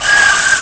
Attack, Aliens; Watcher

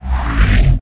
Hyper Space Exit

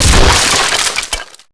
break wood box; breakable

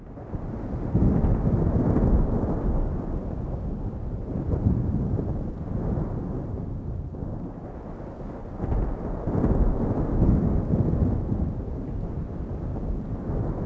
rumble; Sid Meiers Pirates; XBOX

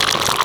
Oil Rolling; Vehicles

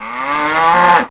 cow

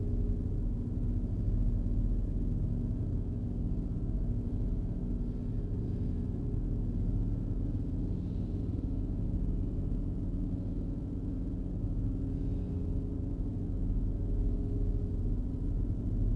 hold area ambience; Star Wars Obi Wan; XBOX

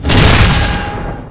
Launch; zafir

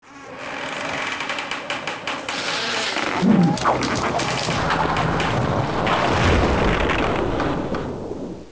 mast fall; shipcharge; Pirates Of The Caribbean

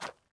footsteps dirt

